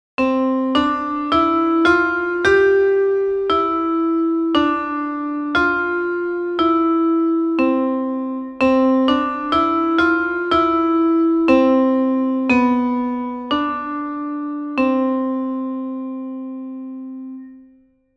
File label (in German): Übungsmelodie in C Dur